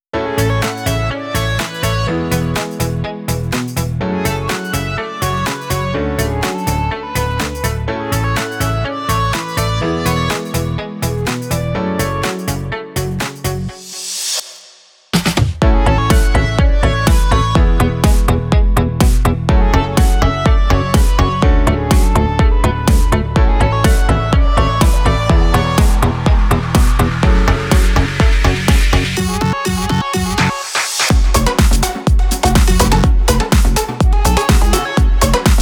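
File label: club house, house electronic techno; virtual balance; By Burak Sound Bank; house electronic techno vol 8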